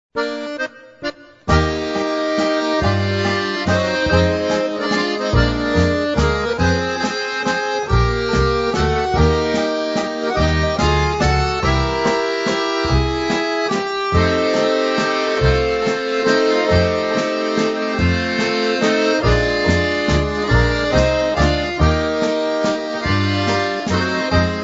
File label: waltz